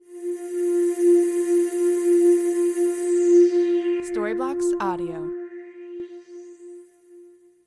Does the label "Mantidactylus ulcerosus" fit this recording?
No